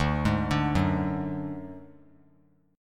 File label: D Major Flat 5th D, chord; arpeggiated; JGuitar